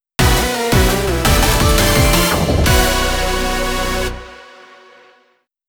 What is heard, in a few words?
Victory!; Rogue Mega Darkrai